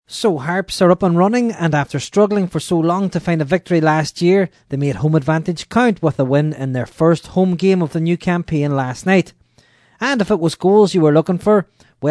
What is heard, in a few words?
HARPS